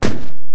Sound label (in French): pas kronos sable; WehikulCzasu; Time Machine; Bruitages